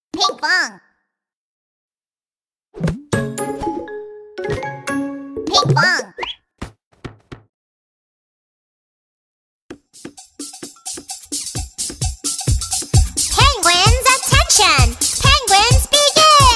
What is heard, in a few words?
Animal Songs Songs for Children